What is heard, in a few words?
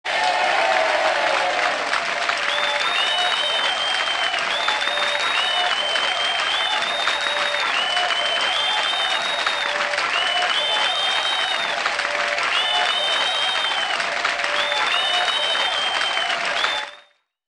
Small Club; Roland BK-5